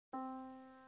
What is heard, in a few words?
yamaha Disk